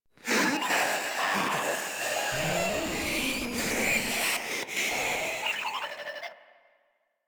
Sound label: Proto Infested Runner Idle; Warframe